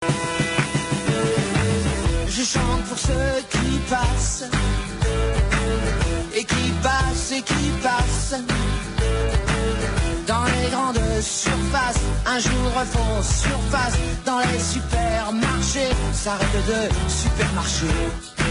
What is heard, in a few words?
test music; RTL